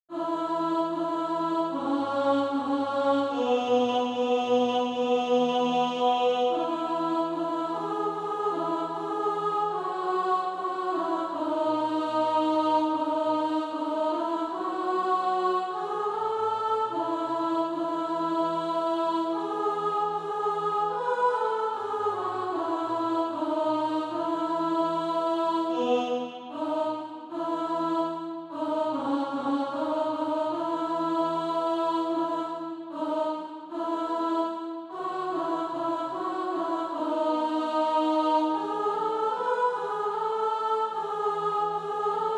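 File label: alt, Practise Music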